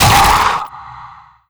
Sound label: vox shockwave yell, zombie revived